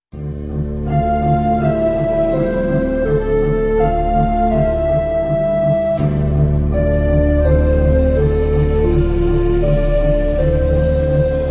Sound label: New Age